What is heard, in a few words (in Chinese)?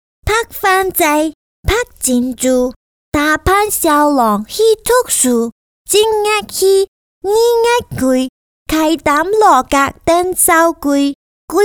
女 客家话 童声谣